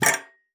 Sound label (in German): Ventil; Xsample VOL-3; STACC+FX